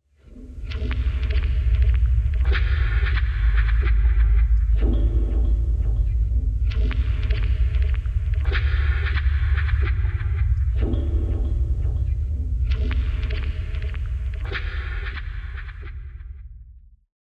Scene ALT No Drone, Complex Cinematic Mystery Sounds; Federico Soler Fernandez